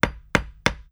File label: piano fix